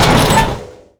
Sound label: metal scrap large impact